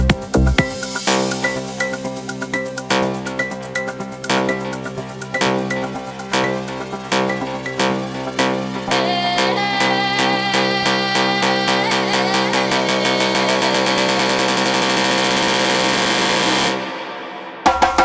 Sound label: Afro Tribal Mix